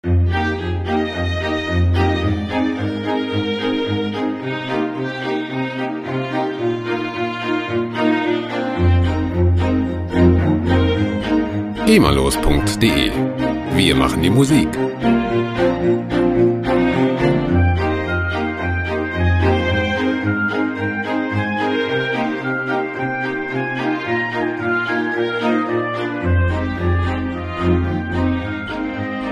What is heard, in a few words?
staccioni string ensemble